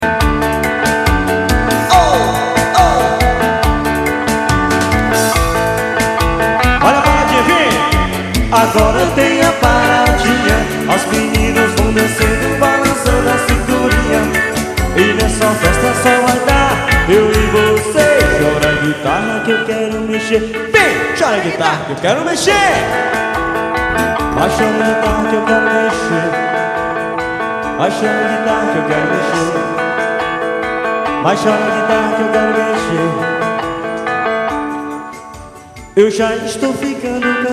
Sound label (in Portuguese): chora guitarra